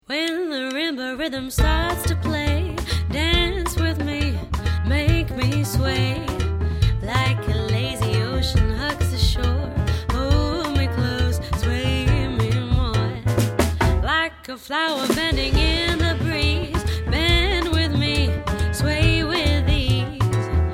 ensemble

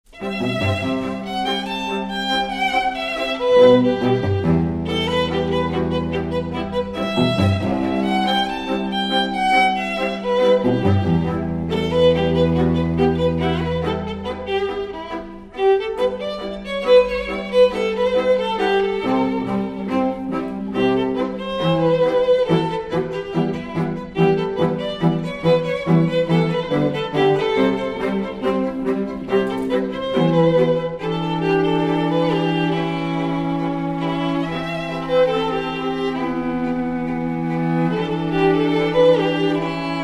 strings; classical musicians

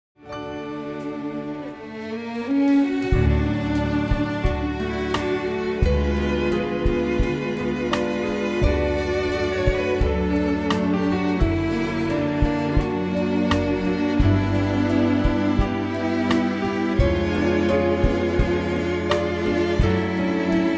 orchestra

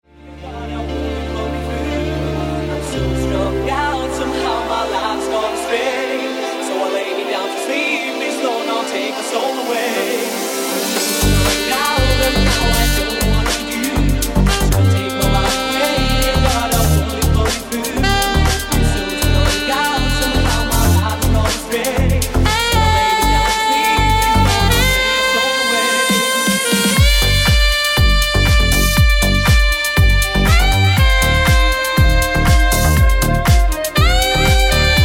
sax, chill mix

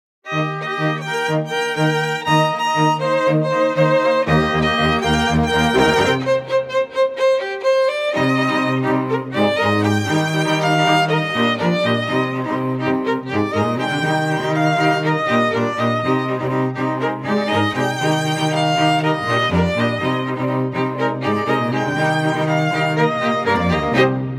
quartet; classical musicians

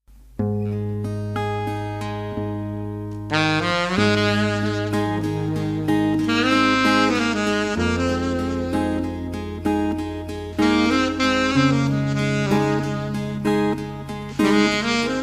sax

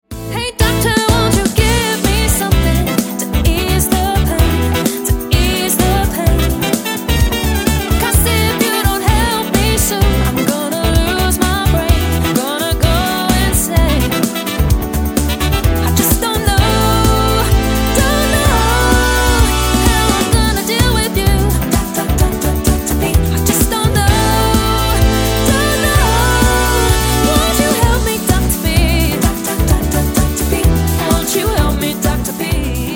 dream pop

